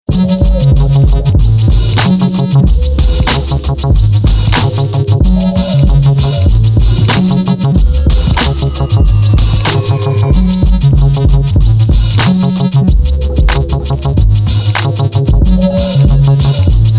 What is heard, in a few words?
bonus beat